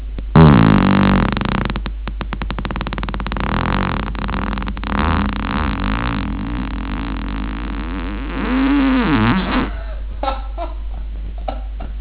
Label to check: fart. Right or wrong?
Right